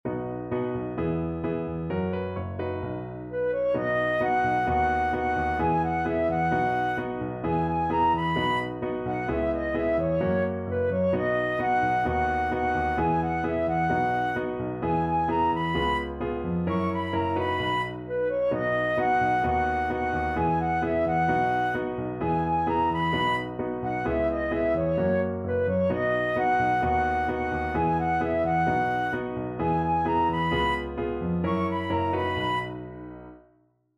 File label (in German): Playback B bzw. H-Dur